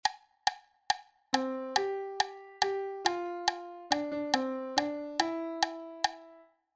syncopation

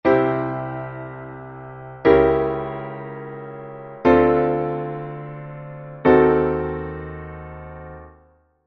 passing diminished

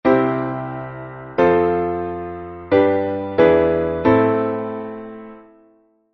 chord progression not passing

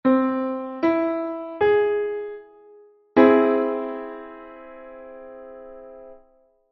C augment chord

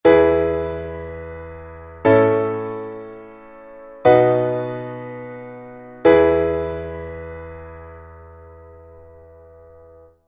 E major three chord

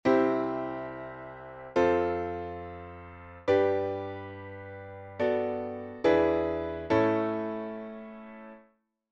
related IIm modulation

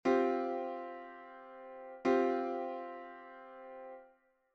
Csus4

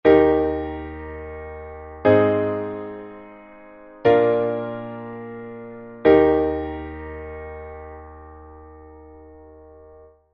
Eb major three chord

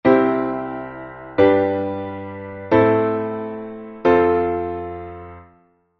C diatonic

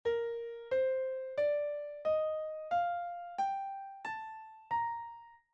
Bb major scale

